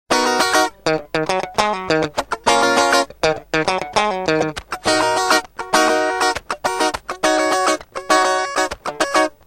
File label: Music loop